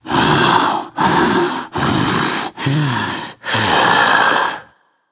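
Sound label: non-verbal breath; smite; voicepack; jingwei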